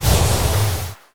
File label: tendril burst; gg pure vessel